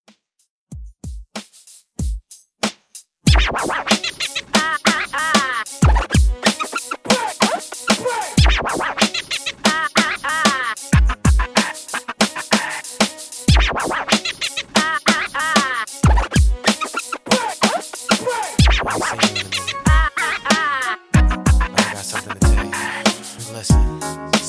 Dj Intro Outro, 94Bpm

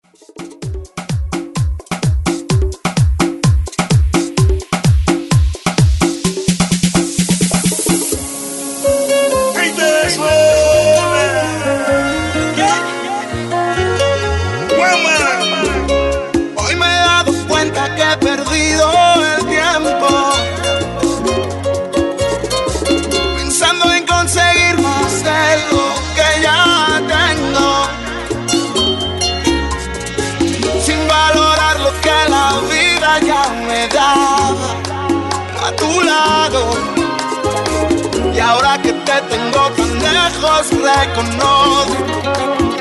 ReDrum 128 Bpm; remixplanet

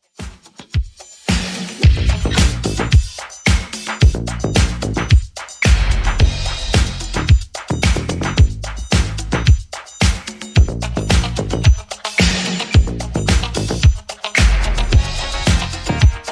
80s Redrum, 110bpm

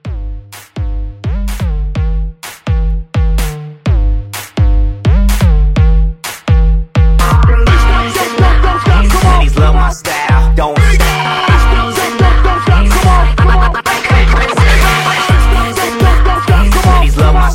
Hybrid Edit 126

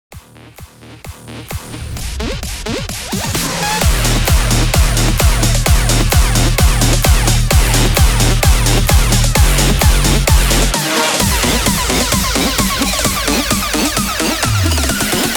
130 BPM

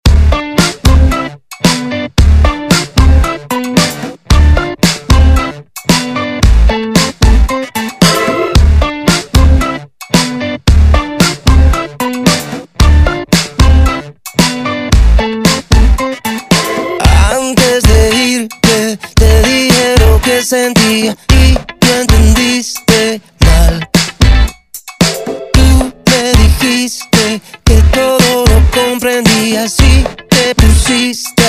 Intro Outro Clean

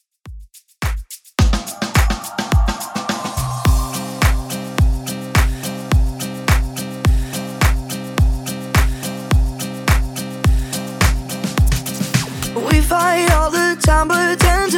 Top40 106bpm

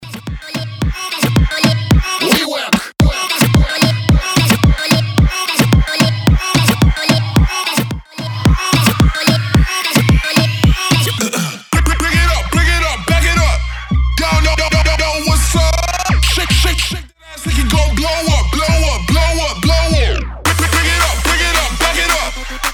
Moombah Smashup 110bpm

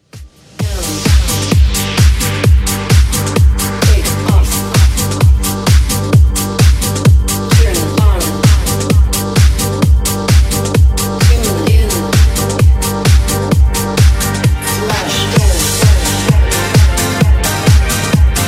DJ Friendly Redrum 130bpm

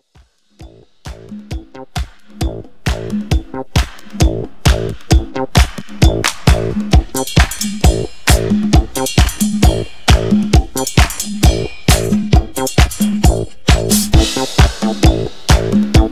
Re drum

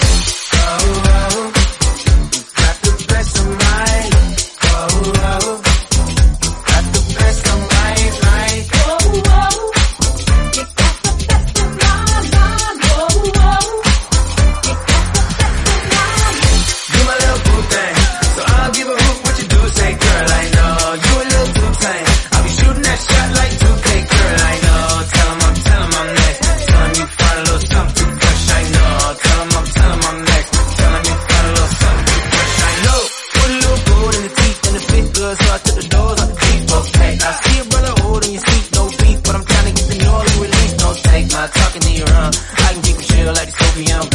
Redrum 117bpm C

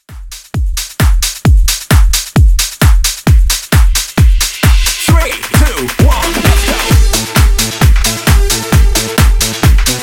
House Redrum 132Bpm

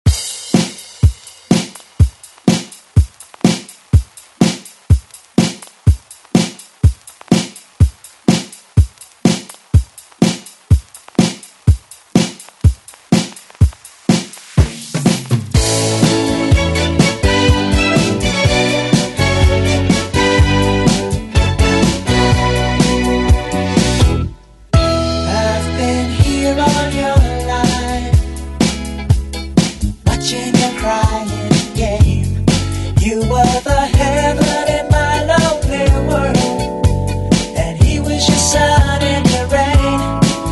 70s ReDrum; DJ Mhark

